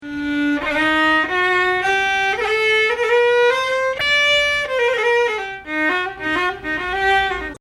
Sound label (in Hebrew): חיגאז, מאקם